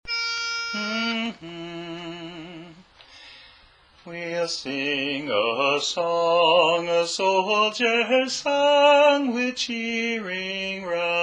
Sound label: Bass; The Soldier's Song